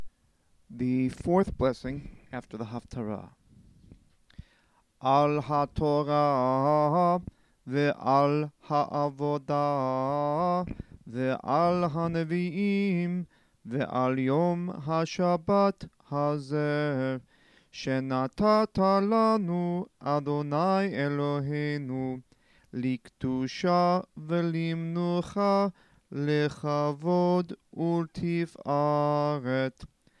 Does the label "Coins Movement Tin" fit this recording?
No